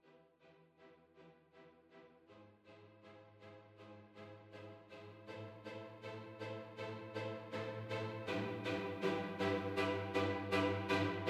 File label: String Ensemble